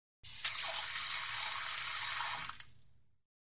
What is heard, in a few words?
Locker Room Sink Fau, sink